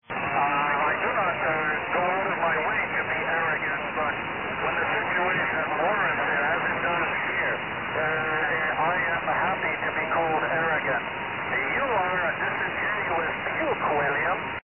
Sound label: puke